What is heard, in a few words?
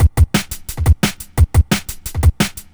175 D&B Drums; Drum n Bass; Pro Tools Essential Loops; Digidesign; Dance DJ